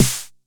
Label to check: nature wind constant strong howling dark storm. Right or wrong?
Wrong